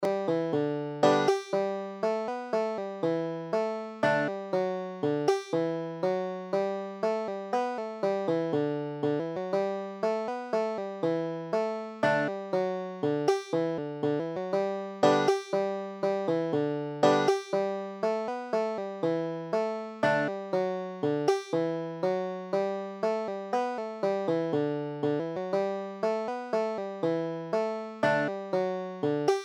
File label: banjo